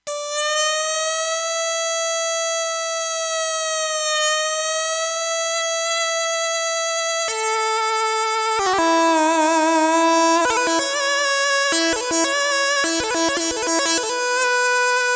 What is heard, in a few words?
gajde